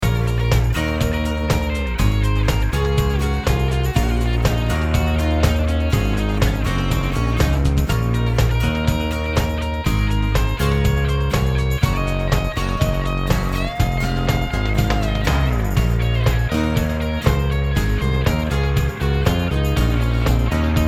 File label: bass with distortion; redd17; waves